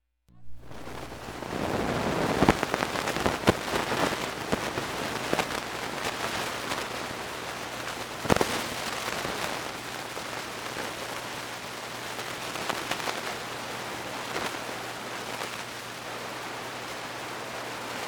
Static, Tube Amp, Constant, Scratchy; Electronics; Tube Pa Amplifier Static , Constant , Some Bursts Of Noise; ca1960s; On Scratchy knob

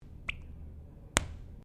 GUN TOY DART STICK POP

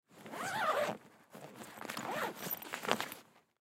Hockey Bag Unzip; Hockey (Sound Effects:Sports)

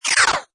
Scifi Dist Swipe; Scifi Production Element - Dist Swipe. Suitable as science fiction film and game production

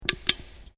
Can Aluminium Inside Bend; various metal cans; Metal (Sound Effects:Materials)

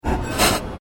PULSE SHORT DOUBLE SCRAPE; Sound effects created by manipulating other sounds; Rises and Dives; SoundEffects-Foundation4

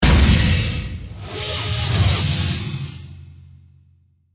Hit To Whoosh, Reverse, Suction, Delay; Production, Element, Imaging, Accent, Transition

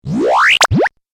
8 Bit Style Magic Change; video game

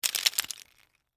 Debris Pretzel Sticks; Texture; Materials; BlastWave

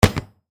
Impact Metal Heavy, Weight, Drop, On, Metal; Wood; Crashes

